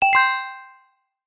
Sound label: multimedia game sound gem treasure found